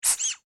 toy duck rubber squeeze; Toy Animals; Toys

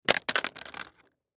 Cell Drop; Cell Telephone Drop Rocks; Cell Phones (Sound Effects:Electronics; Blastwave; Technology

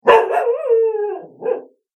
Dog Bark Howl; animal

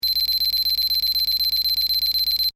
Beeps Data Transmission Technology; Scifi Science Fiction Multimedia; BBFX

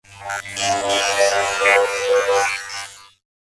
Warped Water Electric Liquid; Andrew Fleming

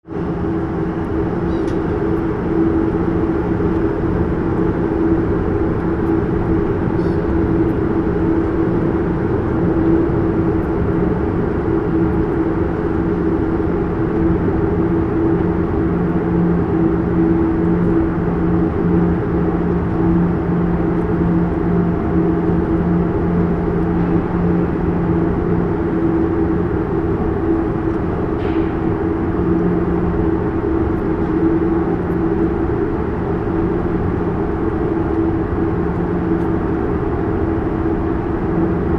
Ship Large Ferry Deck Atmosphere Stationary; Tom Hutchings

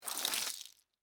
Scrape Penne Rigate; Texture; Scrape Sound Effects; Scrapes (Sound Effects:Objects); BlastWave

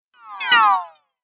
Cartoon Game Spell Whizz, Magic Sound Effects

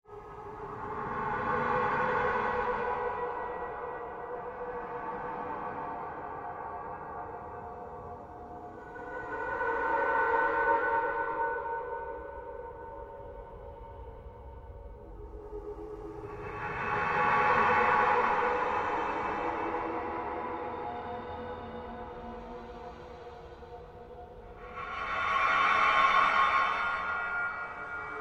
Created Ambience Sweep, Sweeper Sound Effects; Sound effects created by manipulating other sounds

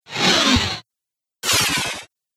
2 Sfx Super Whooshes; Whoosh Sound Effects

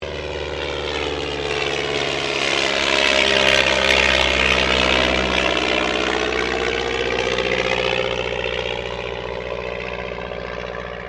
AIRCRAFT DH82A DEHAVILAND TIGERMOTH PASS

